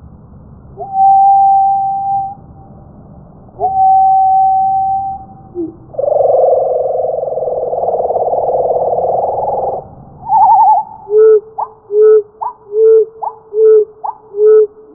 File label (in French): bruant slow